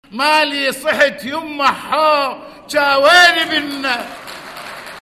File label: loop